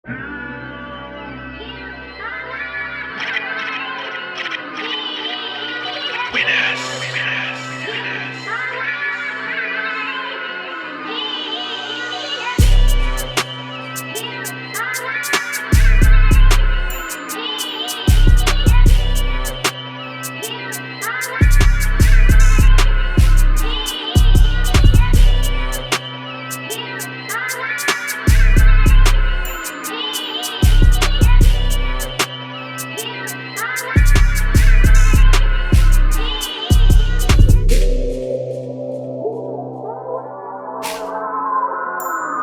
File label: Instrumental